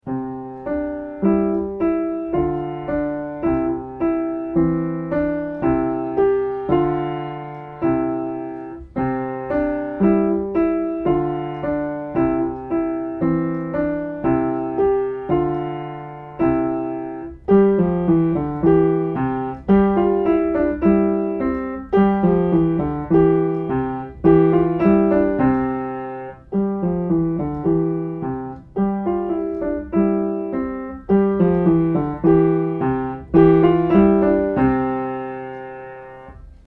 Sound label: old german dance, piano